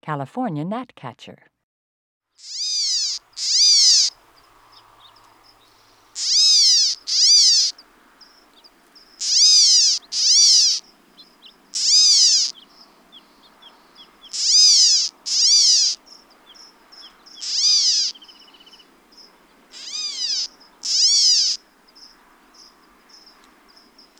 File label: California Gnatcatcher